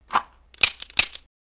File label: Revolver Draw Cock Dr, revolver mvmt